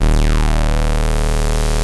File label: OB D#2, VOX, Synthesizer; Hollywood Edge - Giorgio Moroder Rare Synthesizer Collection; OB 4 VOX.